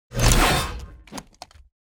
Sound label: swing, sword; bane